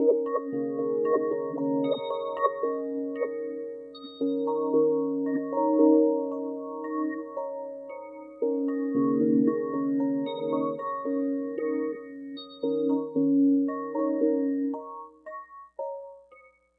Light Bells 114 D#; Percussion Elements

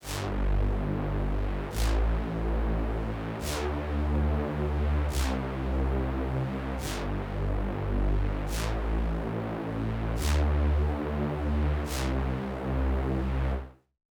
PWM Kit 142BPM Verse - Low Synth DRY, Gmin; Vengeance - Essential Clubsounds Vol.5; Melody & Synth

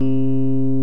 Electric Pianos; OMI - Universe of Sounds; EII Factory Library

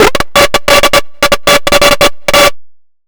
NUCLEAR, Hard & Loud Techno

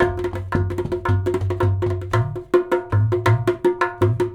DHOL, Indian Traditions; USB Soundscan vol.45; AKAI

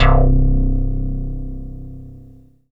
METL BAS, synthesizer bass; Synthesizers; Soli-Music; World of Synthesizers VOL-1

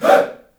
"HEY", Heart of Africa, shout; Spectrasonics; Heart of Africa 1; CH. SHOUT1

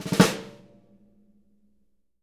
snare ruffs, JZ XSNARES; Sampleheads; Peter Erskine Living Drums

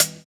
Closed Hat, JUNGLE BREAKS

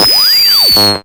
NOIZ FALL, Hard & Loud Techno; USB Soundscan vol.01; AKAI

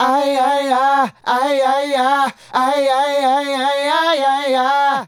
Male Group, Hip-Hop and RnB Vocals

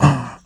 VOX4 L, Roots Disco; USB Soundscan vol.32; AKAI

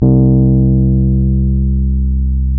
Rhodes Bass C3; Keyboards of The 60's and 70's

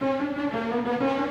GRO, Orchestral Loops; 128 HITCHCOCK

